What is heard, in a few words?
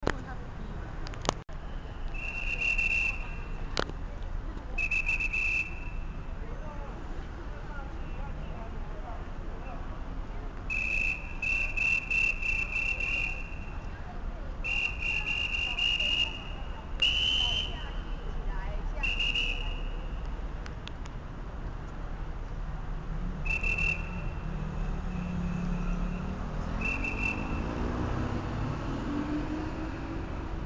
taipei city ambient